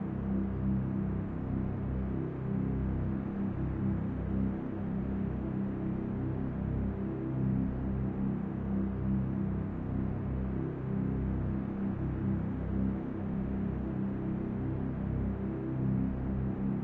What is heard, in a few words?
dark tone, tones; Secret Agent